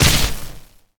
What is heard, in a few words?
spirit break